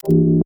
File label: cry error; garrysmod